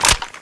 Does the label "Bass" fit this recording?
No